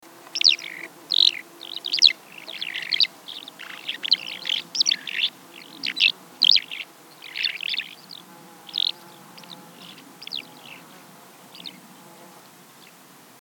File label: Skylark